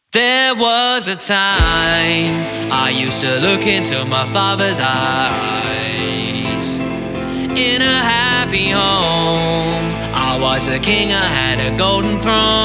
Electronic Duo

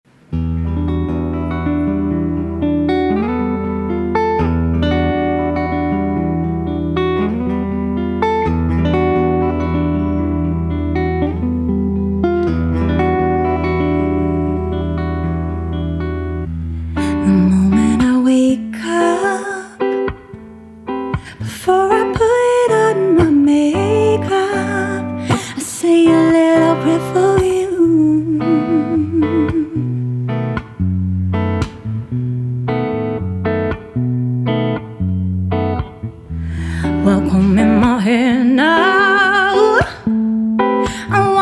Solo

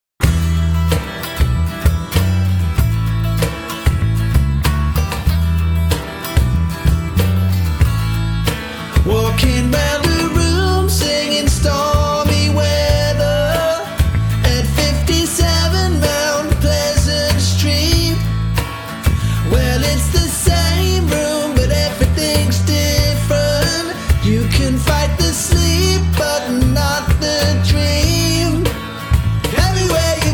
Acoustic Trio

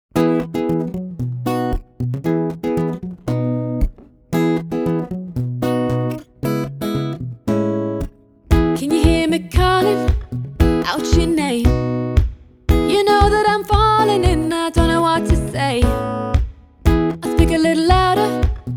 Acoustic

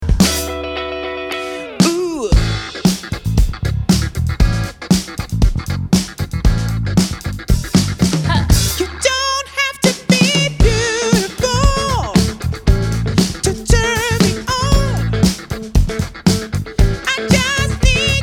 funk

